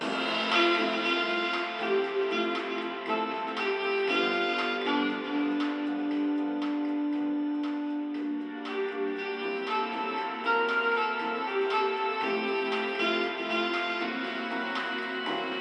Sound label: Lounge Reception; High On Life; Port Terrene